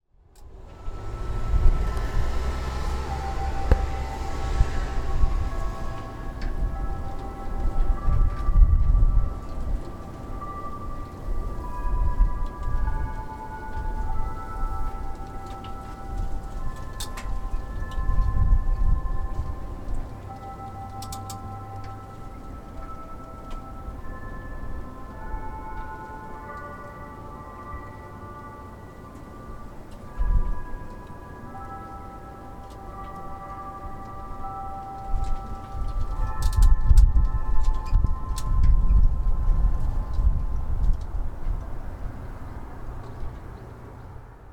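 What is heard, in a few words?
time signal, Minowa, noon; 時のお知らせ（箕輪・昼）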